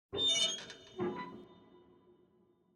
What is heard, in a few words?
torture cage open; ambience room